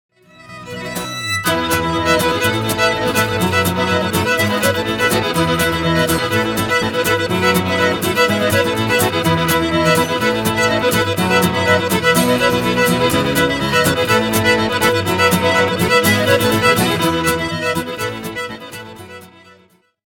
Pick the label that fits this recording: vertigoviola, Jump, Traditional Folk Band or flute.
Traditional Folk Band